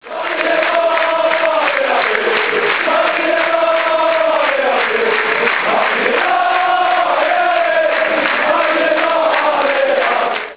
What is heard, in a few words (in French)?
Marine et blanc allez allez, chants